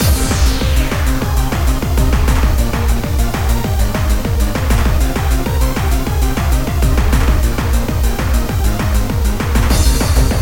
music water

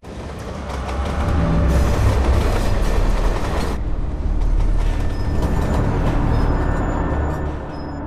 train boss defeated

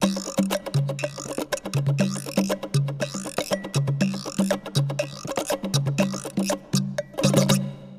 percs

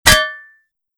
bullet hit tin

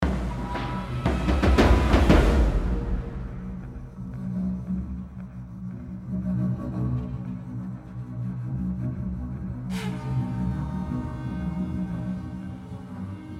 Intense Perc and Battle Horns; Hunter City End IGC

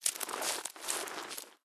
Attack; Rotten Forest

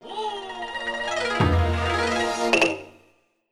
Jingle Minigame Clear; Sakura Samurai Art of the Sword; Wii

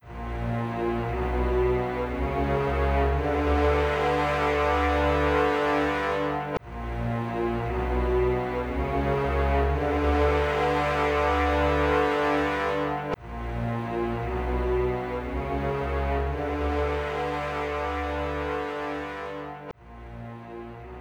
Bossbattle Intro; Might and Magic Clash of Heroes

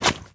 Footsteps; we are the dwarves gamerip 2016; Swamp Jumpers

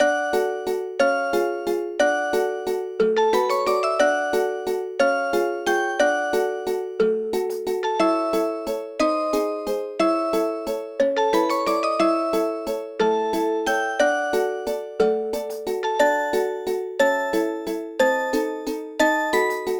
Underwater; Photos with series; Super Mario Bros

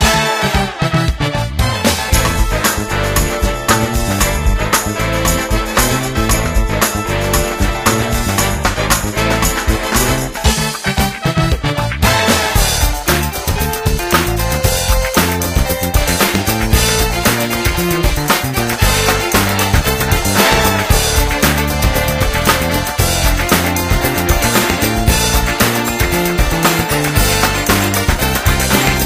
Main menu music; Diner Dash 5 Boom

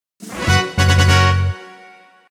Jingle Levelup Short; gensou shoujo wars dream of the stray dreamer

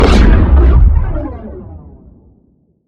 Sciweap Shot Large Gun Carbon Injector; Robobeat

